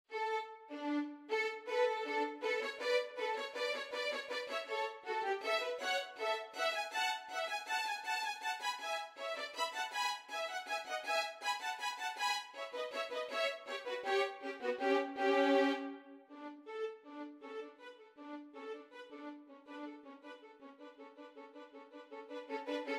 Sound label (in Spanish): 2 violines; duo violin; nivel dificultad medio